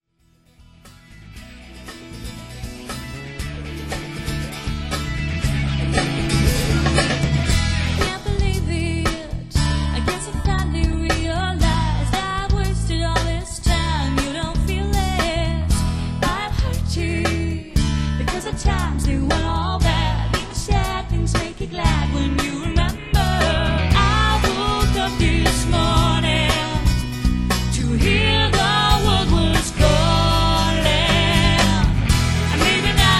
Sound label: duo buddy